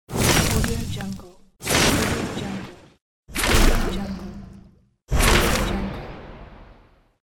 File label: elements spell pack